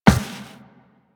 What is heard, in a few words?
Walk Metal, Footsteps; Warframe; Operator